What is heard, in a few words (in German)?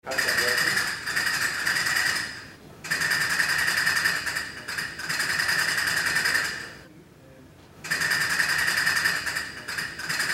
Castagnetten, Rundfunkorgel